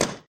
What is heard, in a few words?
shot; brawlguys